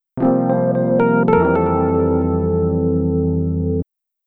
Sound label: Apologetic Message; Wii Room; Shopping Update